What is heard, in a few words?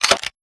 load, sawedoff